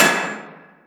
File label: FX Shot, Boss DR660; drum machine